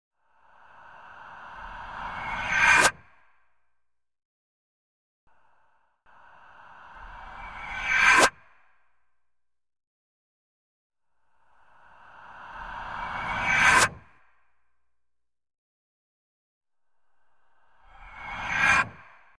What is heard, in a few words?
Reverse Stinger - Eeire Ghost Rips x4; Hollywood Edge Sound Designers Tool Kit